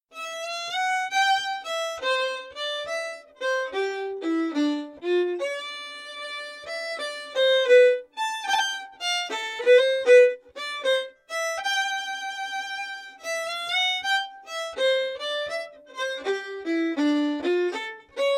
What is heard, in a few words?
slow, fiddle